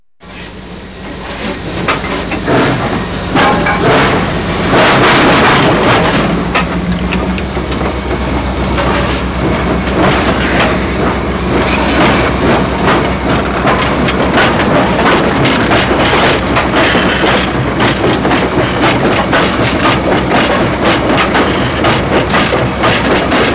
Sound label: train